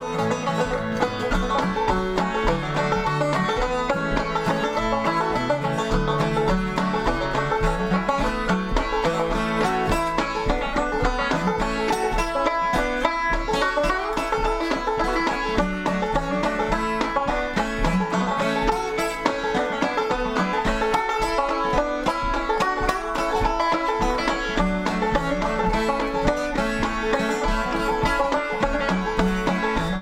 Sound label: musicbox